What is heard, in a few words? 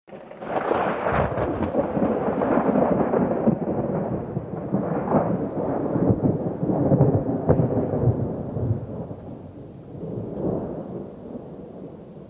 Peal Thunder Clap